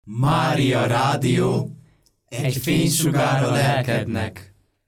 JINGLE; STUDIO GYULAFEHERVAR

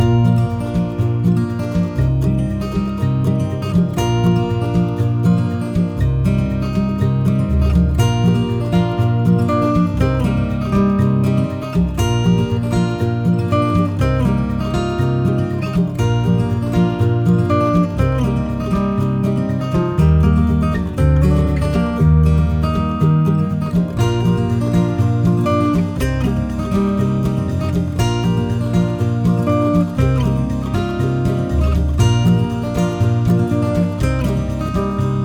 acoustic loop; szignal zenek; Várad

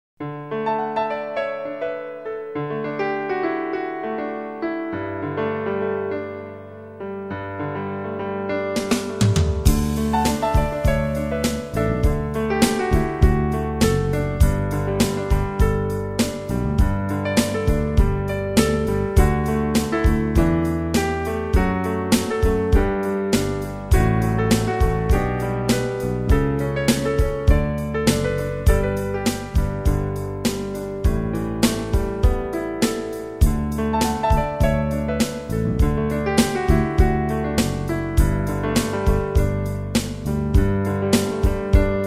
happy times, music

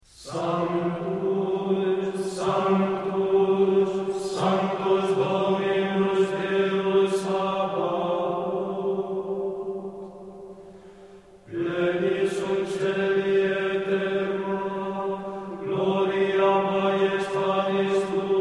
Altspanische Gesänge - Sanctus, Gregorian chant; Abadía de Santo Domingo de Silos

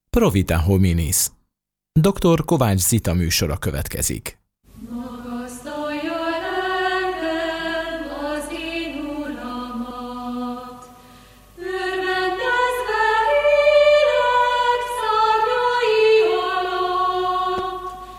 Jingle; Pro Vita Hominis

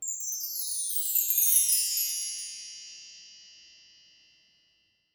chimes high to low fast; Harang csengo

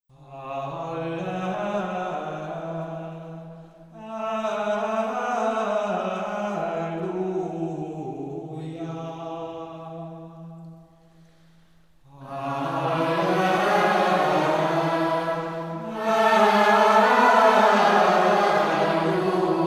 Gregorian alleluia; Adventi mise nroraten i.; Adventi enekek

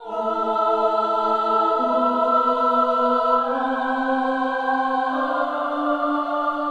a minor vocal choir building loop 143 bpm; LOOPS

